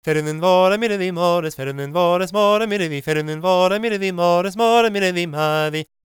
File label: GAELIC, World Voices; ILIO; Vocal Planet